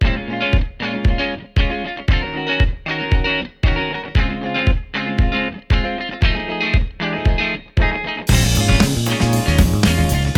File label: funk; KontaktPlayer